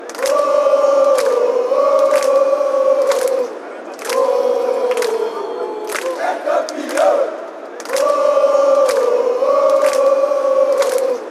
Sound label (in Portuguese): e campeao, fan chants